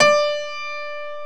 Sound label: D5 PIANO